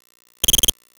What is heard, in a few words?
cricket